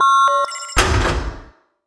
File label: button main